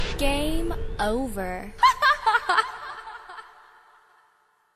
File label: game over hahaha